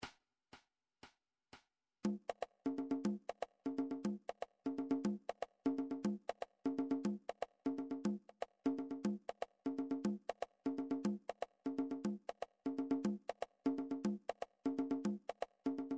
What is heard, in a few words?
drum trax per